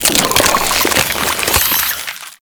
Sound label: Infested Weakpoint Spawn; Warframe